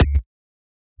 die; hexagon glitch 3

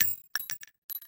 ammo shell; jet boi

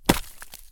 enemy kill; tap touch run

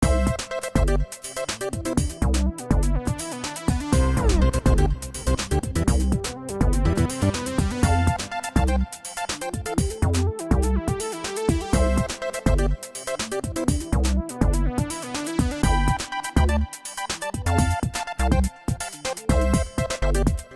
music; candy blocks

